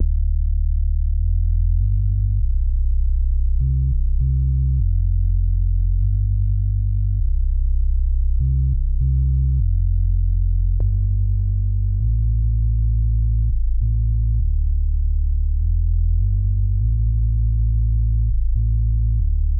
100bpm light staccato cello SYNTH BASS

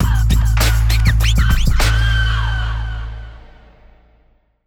100bpm light staccato cello STING

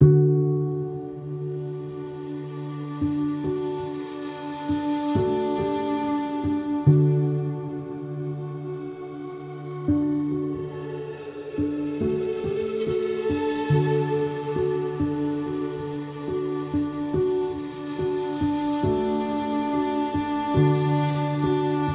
70bpm sentimental piano

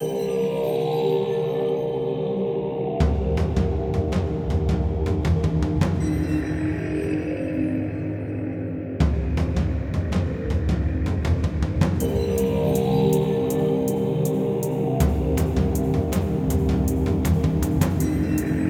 160bpm driving struggle breaks

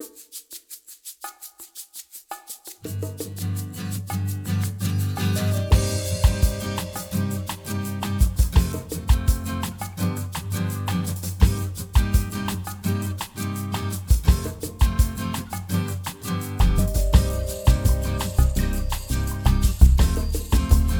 84bpm light summery guitar

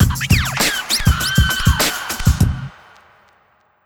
100bpm light staccato cello ENDING UNDERSCORE

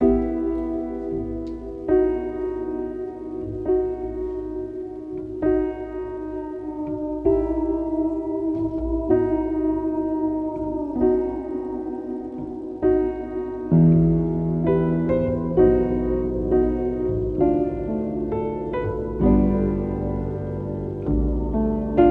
65.5bpm pensive piano

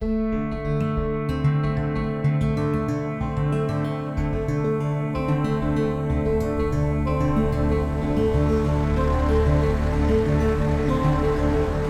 125bpm tense driving

